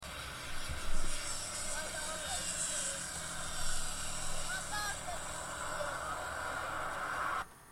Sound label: Braking